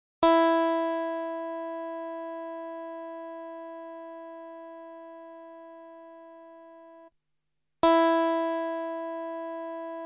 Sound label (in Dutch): Hoge E, Slaggitaar; Meespeel CD; Slaggitaar 2